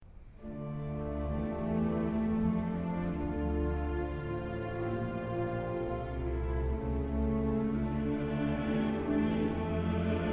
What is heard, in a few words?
Requiem Kyrie; Fauré; Opus 48